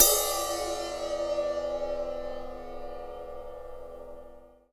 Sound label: drum ride sample d key